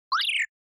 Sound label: Motion Pop